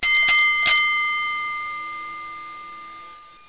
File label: bell box